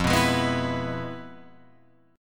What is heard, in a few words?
F Minor Major 7th Flat 5th Guitar Open F; FmM7b5 strummed; JGuitar